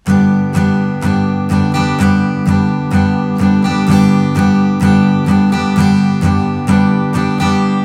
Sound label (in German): Schlagmuster